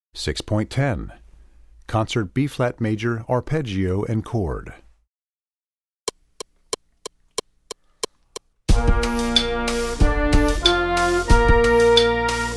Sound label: Concert B flat Arpeggio